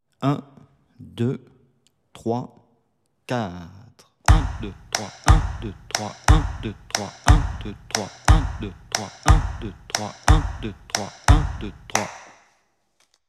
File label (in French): methode pour travailler un rythme 060bpm, guitare